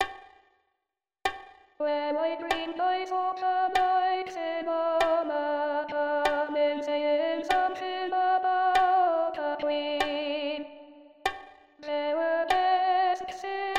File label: Alto, Chorale